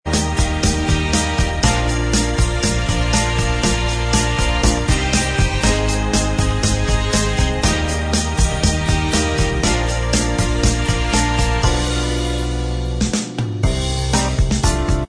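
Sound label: instrumental, backing track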